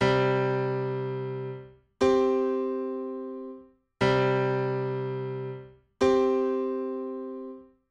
Intervalo armonico quinta justa

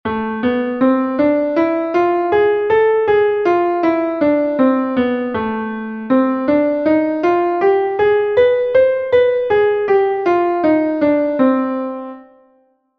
escala armonica

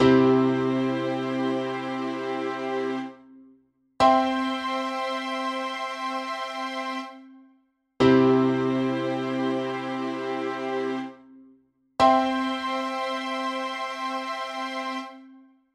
acorde triada mayor

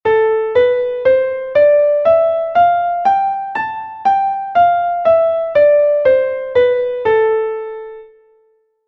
la menor natural

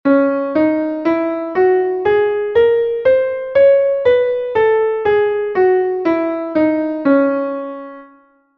do sostenido menor melodica

